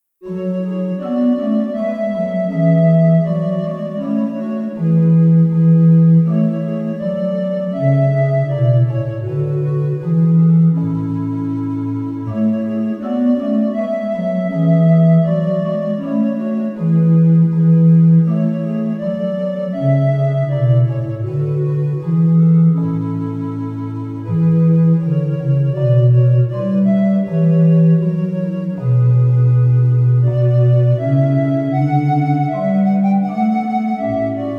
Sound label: Instrumental